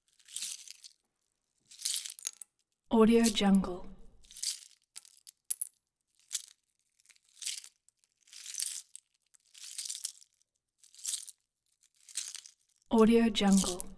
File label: ice breaking